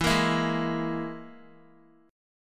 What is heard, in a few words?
E-Major Flat 5th E, Guitar; strummed